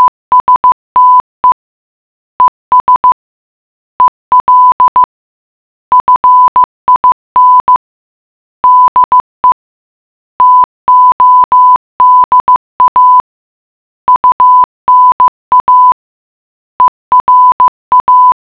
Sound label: morse